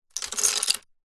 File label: leisure lego pieces pick up from wood table; zapsplat